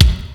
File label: Kick, Fairlight CMI III